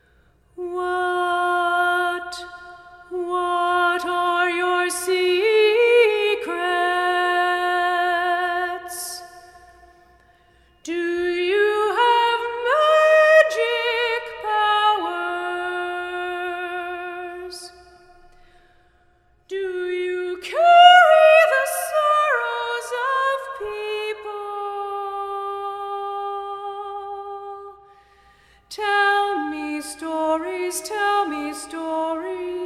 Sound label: Solo Lines